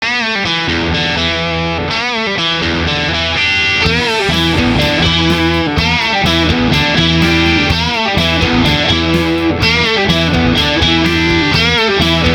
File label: Flanger